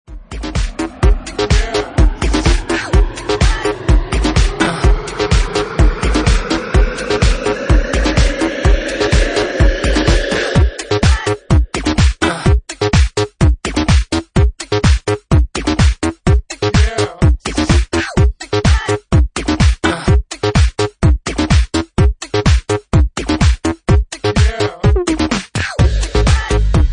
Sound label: Electro Soundtrack